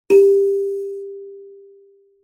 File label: MBira pluck Main G3, Kalimba, Tanzania; Idiophones; Plucked Idiophones